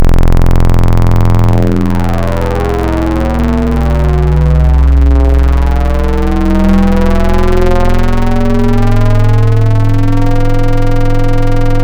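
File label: Square Formant; Synthetic's Serum Collection; Cymatics - Spectral FM Wavetables Vol 2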